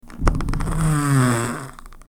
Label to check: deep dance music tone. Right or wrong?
Wrong